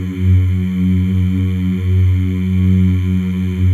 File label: MALE MMS, Symphony of Voices; Spectrasonics